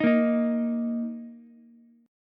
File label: D sharp 5th, Ukulele; strummed; D#5; 8,10,11,x; JGuitar